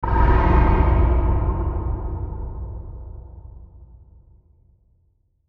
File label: Hit Flange, Hit Flange Deep; sound design; Blastwave